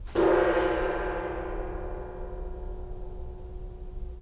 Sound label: gong